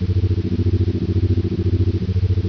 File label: loop console; gearbox; klingon